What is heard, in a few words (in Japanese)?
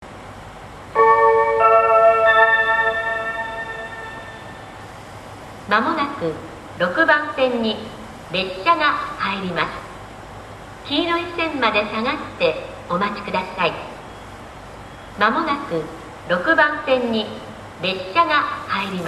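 yamagata sekkin, 接近放送; １番のりば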